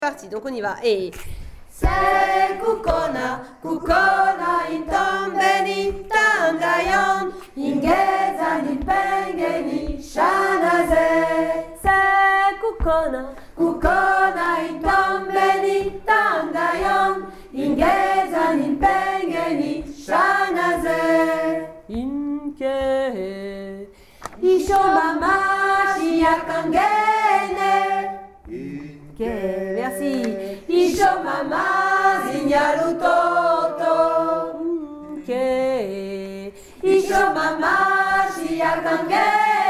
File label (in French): Kukhona chant groupe